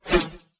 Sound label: blade 1 hand bound swing